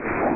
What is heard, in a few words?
MISSLE